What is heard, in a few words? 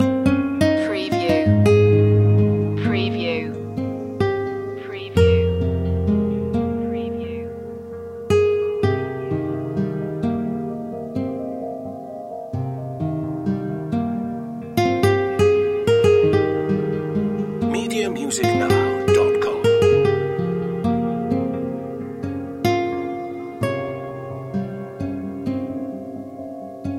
guitar blue loop